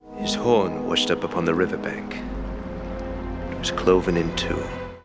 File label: horn; His horn washed up on the river bank, it was cloven in two; Faramir (EE); Lord of the Rings : The Two Towers